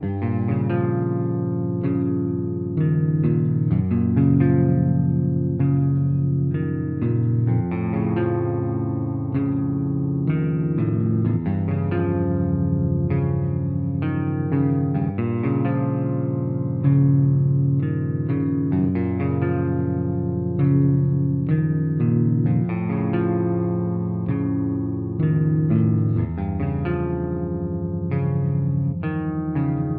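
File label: music (workshop tune); unsighted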